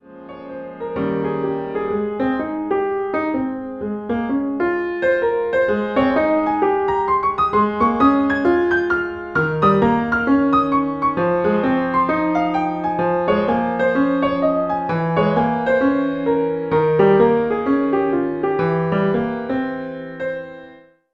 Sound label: intermediate piano solo